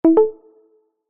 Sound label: notification tone soft subtle